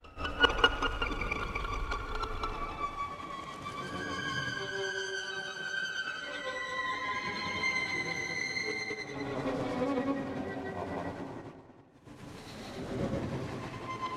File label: Horror; Among the Sleep